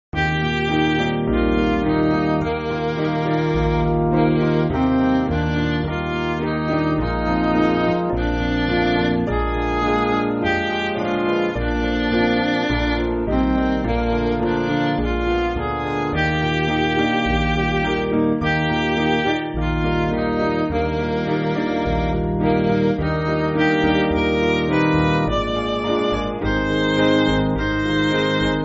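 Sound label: Midi, Music Backing Files for Churches; I Would Be True; Peek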